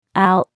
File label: owl